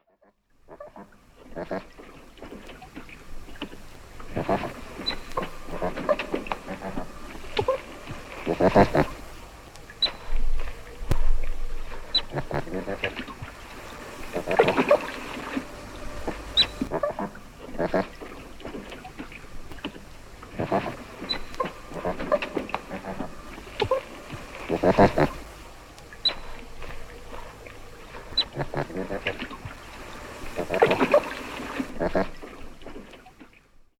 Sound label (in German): Fischotter Kontaktlaute, haarwild